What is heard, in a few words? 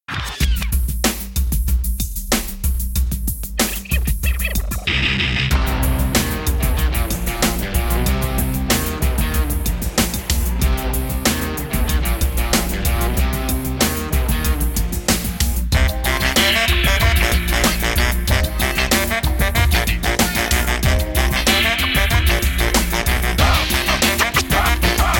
intro music; simcity 4 rush hour deluxe